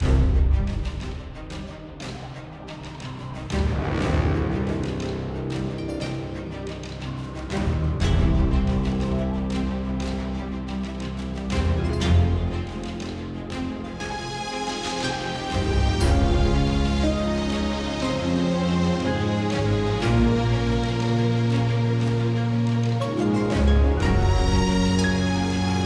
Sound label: freespin Music; netent